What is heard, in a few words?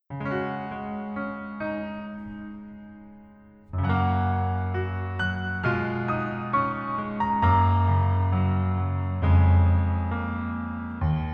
Piano ver, 멜로디MR